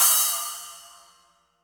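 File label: Cymbal Stick Open; Latin Percussion; Drums; Drum Hits; Ableton; Factory Packs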